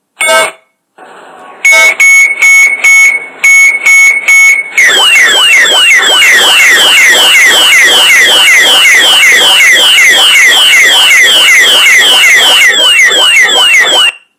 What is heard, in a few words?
Alarm Sound